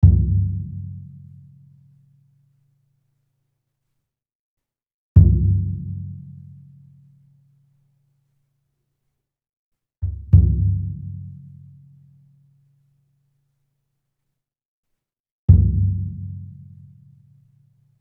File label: Big drum; Stars (Tachenump)